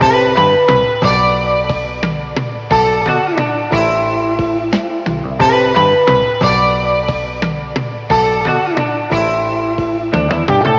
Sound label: guitar, loops; Phonky